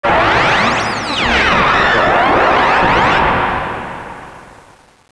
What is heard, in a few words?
SHOOTING; Roly-Polys no Nanakorobi Yaoki